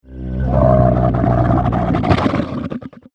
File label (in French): bruit